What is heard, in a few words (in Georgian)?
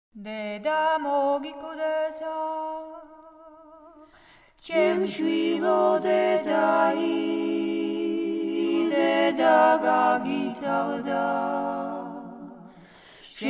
deda mogikvdesa tushuri simgera tirili; Mzetamze